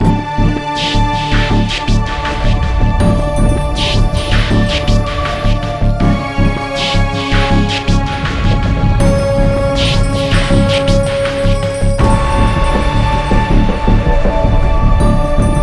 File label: Musik Cristal Exploring